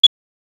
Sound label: a sharp high pitched rada